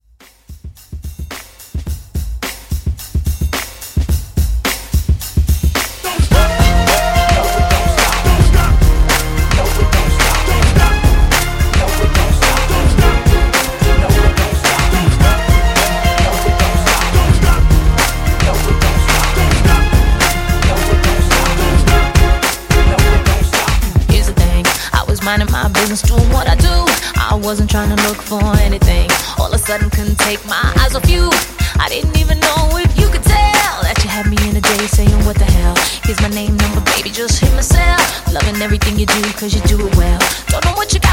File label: Pop Redrum Hype; REmixfreakz